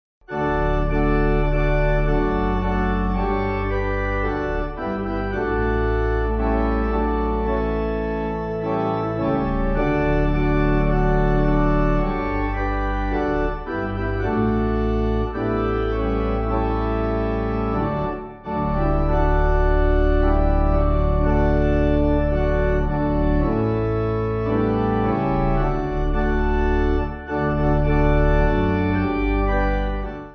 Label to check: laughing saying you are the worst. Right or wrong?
Wrong